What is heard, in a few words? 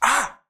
hurt, creature